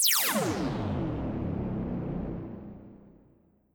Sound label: Energy Conv Energy